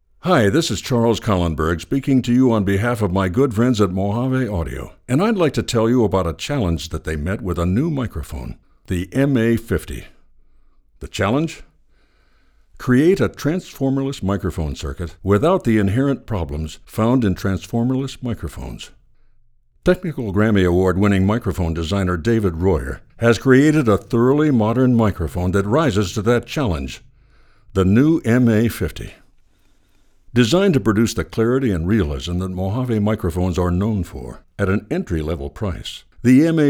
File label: Chest Voice; MojaveMA50; RAW